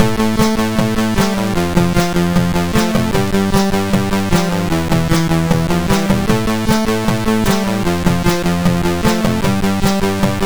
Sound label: Intro, sid MOS6581; Afros